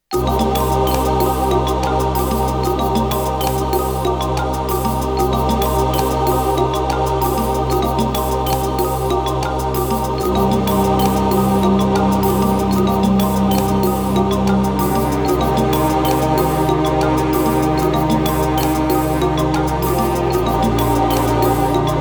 SC-88; Kara no Naka no Kotori; PC-9801; An Erased Voice is Noise

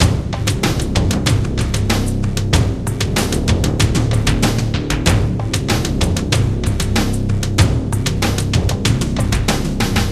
sounds music; Halo Combat Evolved; Xbox

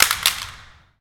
Gear to floor

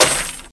Kristall Big; I Am Weapon: Revival; Nano

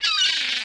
Ambient Sea Gull

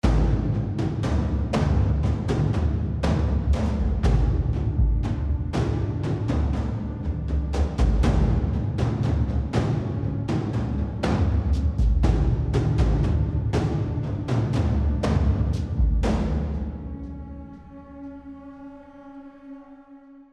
Tension; AB Tension 3 High Chunk 3

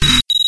Vehicles; SFX Vehicles; Scorpion

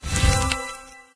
Tornado Ground; candy crush saga gamerip

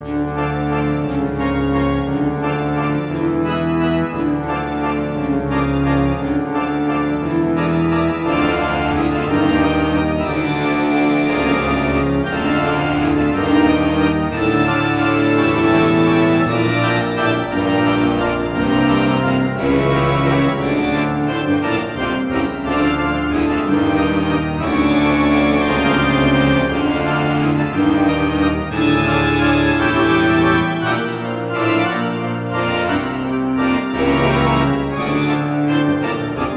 Opera Organ; World of Warcraft; The Burning Crusade

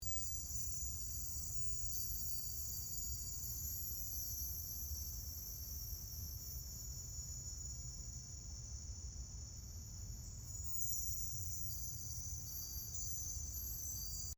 Floating Symbol Ambience; Quad Fronts2; Journey

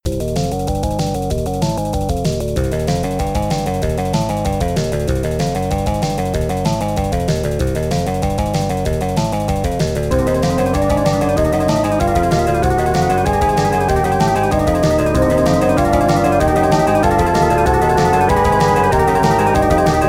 Guitar Theme; Tales from the Crypt; Data East; pinball

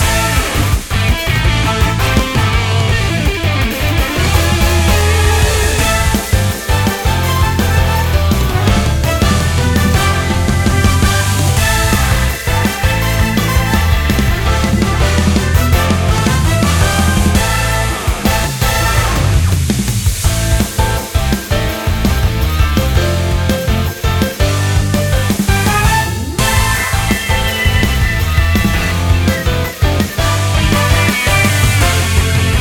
Battle Theme; Canto II Battle Theme B2

